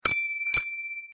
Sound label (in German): Fahrradklingel Motion II